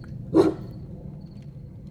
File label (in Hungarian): egyet ugat, szurkefarkas; hortobagyi nemzeti park 2013